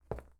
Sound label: Walk; Mother Nature